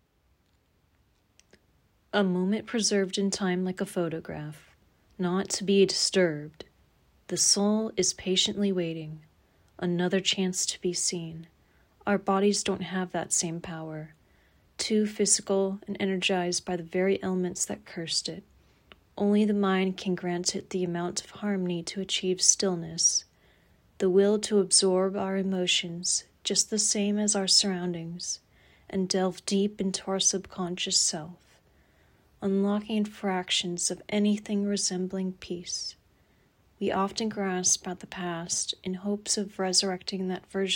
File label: Stillness